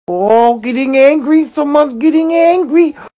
getting angry

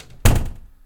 door close; baldis basics

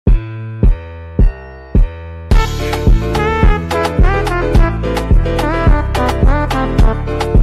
rat dance song

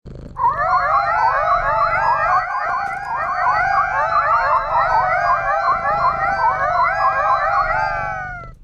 cat meow loop

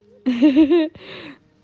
laugh

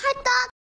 hihihi

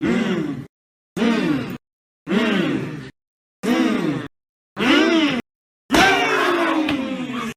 hmm hmm hmmmm

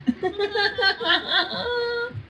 fake laugh

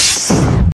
freeze; piper

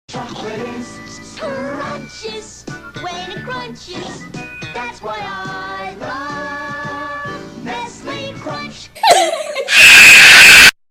kid had a meltdown; nestle crunch

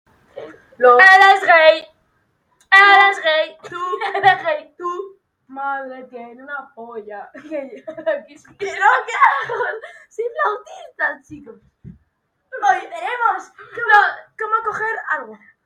ahhhhhhhhhhhhhhhhhhhh

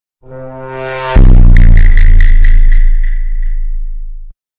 among as rol bass bust

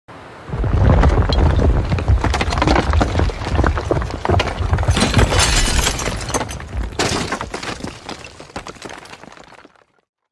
wall crumbling

